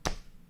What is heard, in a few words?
Clap; rimworld-animations